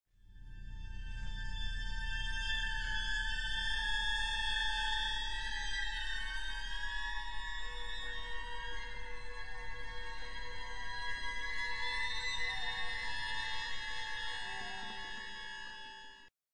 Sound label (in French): gliss